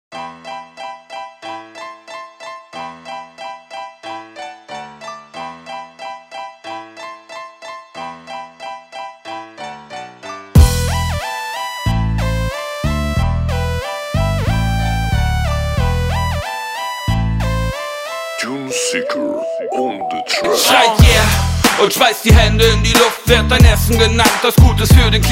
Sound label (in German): Rap nur Refrain